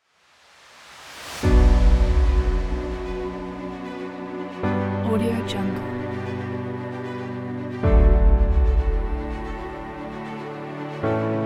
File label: inspiring epic trailer